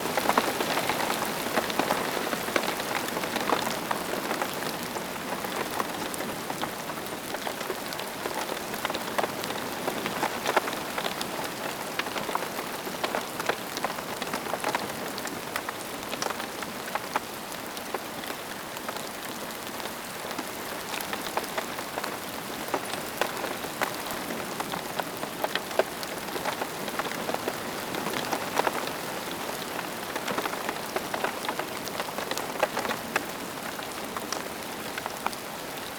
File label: Rain Sounds - Relax